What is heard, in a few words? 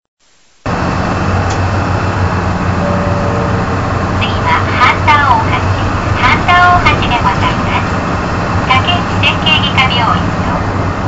bus tape orgel; aichi; chitabus